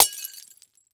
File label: Household Glass Vase Break On Cement